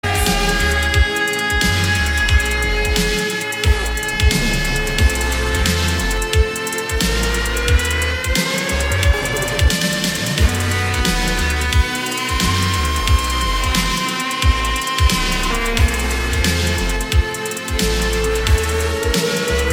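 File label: Theme background music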